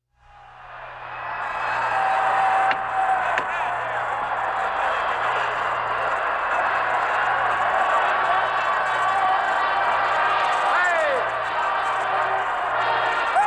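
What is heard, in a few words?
Cheering Crowd, Short Bursts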